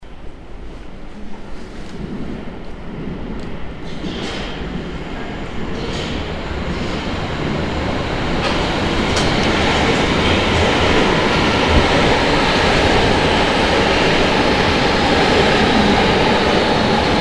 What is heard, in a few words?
subway double arrival